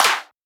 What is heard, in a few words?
Shifted Clap, Claps; FLPRO